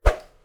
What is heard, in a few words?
Whoosh